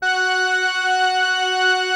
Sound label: Atmo Pads; Best Service; XX Large